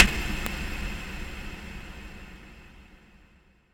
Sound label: Shac Verb, One Shots Hit; Sonic Foundry (Sony Creative Software) - Future Retro Dance Excursions